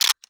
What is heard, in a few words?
sks insert